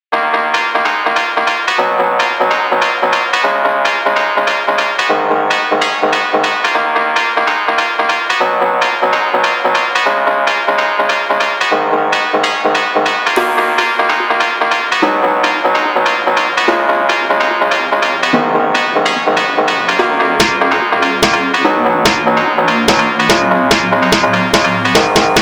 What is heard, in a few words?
Phonk